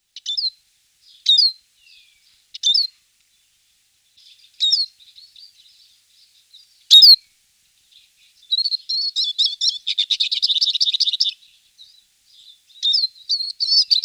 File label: Tarin des aulnes - Siskin; Les oiseaux de nos jardins